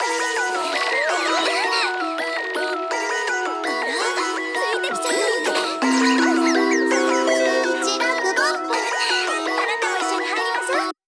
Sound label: BM BPM165